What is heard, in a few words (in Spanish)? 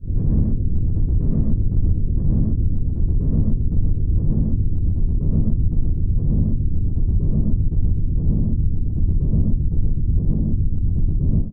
granulados